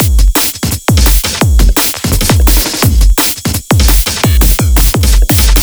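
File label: Percy Beat B, drum bass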